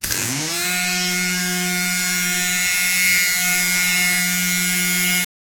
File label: maker sound sound of mark, drawing